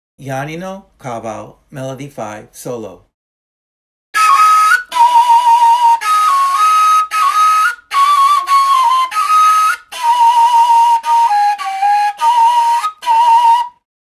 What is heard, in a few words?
kaval solo